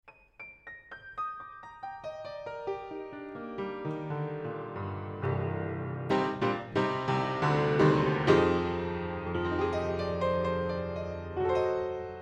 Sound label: Piano, Panels Off 50cm, Spaced Omnis 80cm Upper